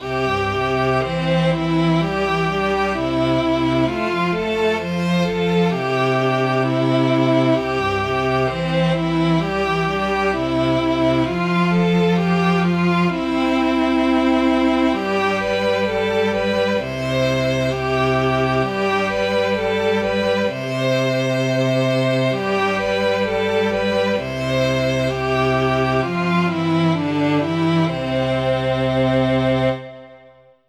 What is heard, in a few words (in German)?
Lustige Melodie; aschenputtel